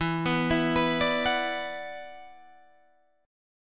E 7th Suspended 2nd, down, Guitar, Open D

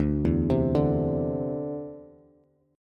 D 7th Suspended 2nd down Bass; D7sus2 arpeggiated

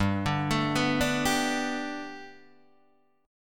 G Major Add 9th Guitar Open D, chord

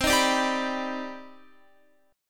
C Minor Major 9th C Guitar 4F,4A,5C,5G,5C,6E; CmM9; strummed

